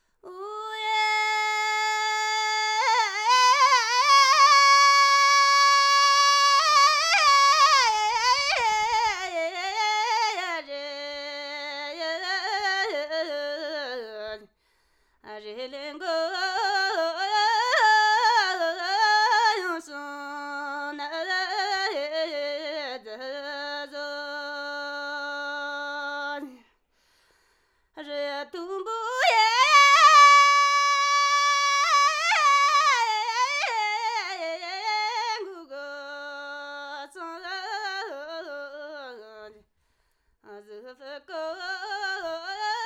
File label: Dkyil 'khor Folk Song